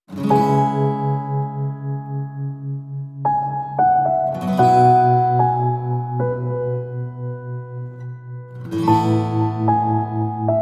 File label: Calm And Serene, No Drums